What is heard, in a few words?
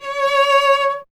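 strings cello C#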